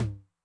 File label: Mid Tom Noise, 909